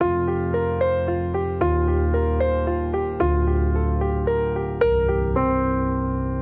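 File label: Hopeful Piano, F Major 112 bpm; MIDI Clips; Tonal; Motifs; Ableton; Factory Packs; Mood Reel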